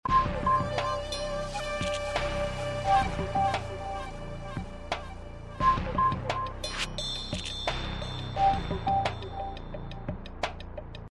Intro music

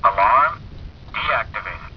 alarm, buick

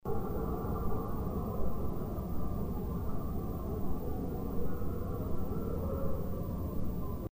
barred owl

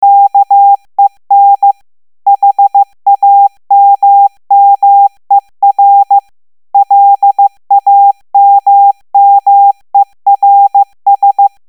hammer Morse

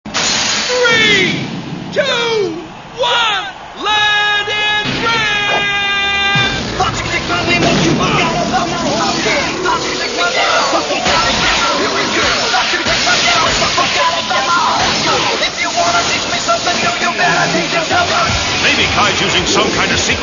Swing Low

